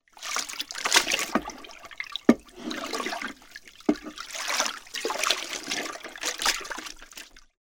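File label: mop from bucket onto floor and washes splashing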